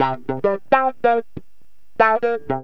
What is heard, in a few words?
GTR, chank; Zero-G; Funky Elements